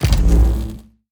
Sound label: Ui Select colony site Stereo